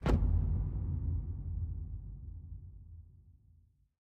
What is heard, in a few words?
reel Bounce; rise of maya